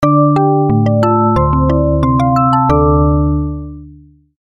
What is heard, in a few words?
notification